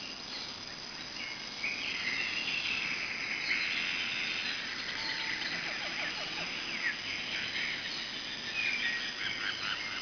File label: Jungle, ambience